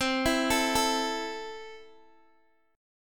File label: C 6th, C, down, Guitar, Open G